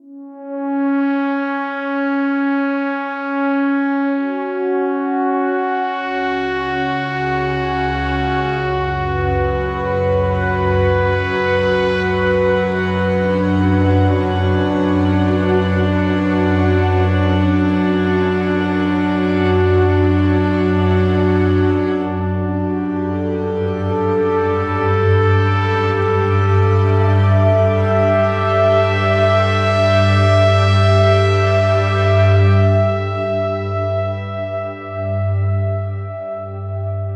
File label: bg music loop; lowglow